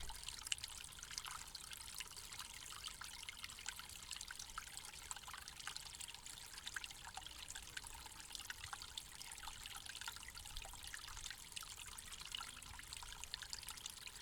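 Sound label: Rippling Water Natural Source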